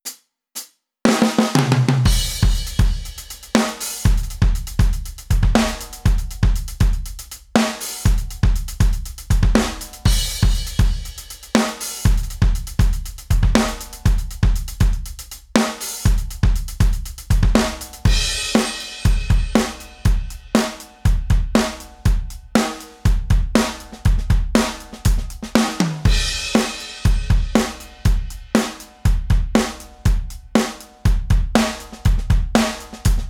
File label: bassline beats